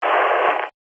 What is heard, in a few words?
technology cb radio burst noise static